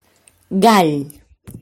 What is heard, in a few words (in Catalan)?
Gall